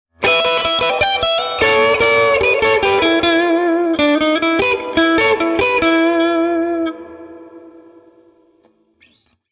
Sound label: tele, Swart AST